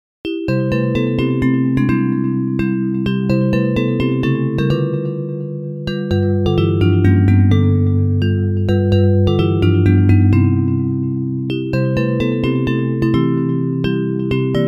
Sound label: Jesus Es Mi Rey Soberano Bells